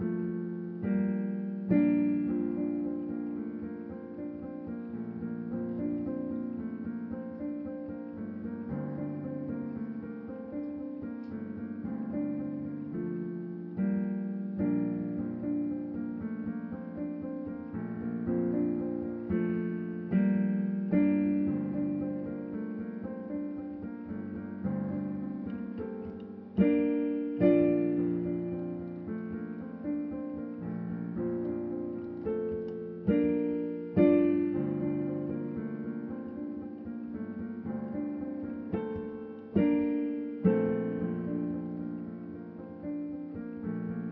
Piano (Solo); ゆめ2っき

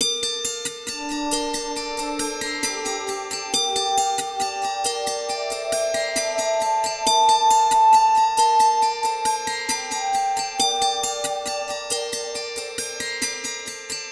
drm oop 135; ゆめ2っき

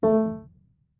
marimba piano; ゆめ2っき

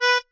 marimba chainsaw; ゆめ2っき

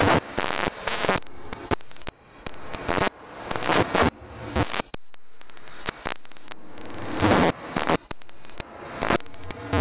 Creepy Glitchy

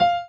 marimba; ゆめ2っき

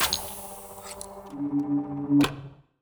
piston

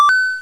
obtain

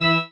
marimba grave; ゆめ2っき

